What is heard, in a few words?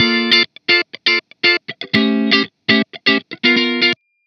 dry amp guitar funk; prism distortion